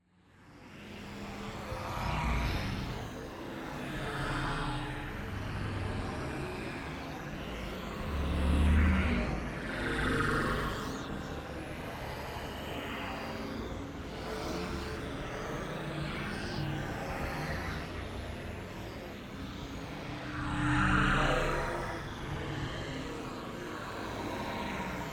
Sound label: AMBIENCE Binary Mist; boom library; Matter